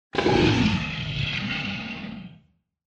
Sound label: Monster